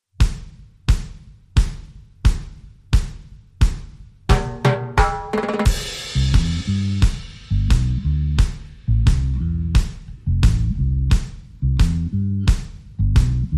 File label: Drums and Bass